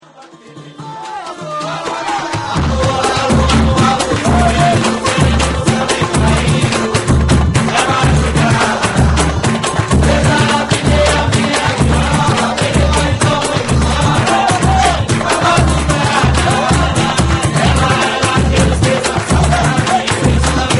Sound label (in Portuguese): samba